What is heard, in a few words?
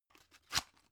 drug pull out hard; arzt